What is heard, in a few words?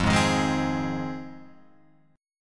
F Minor 7th F Guitar Open F; strummed; JGuitar